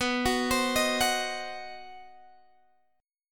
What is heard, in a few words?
C Diminished B, chord; arpeggiated; Cdim/B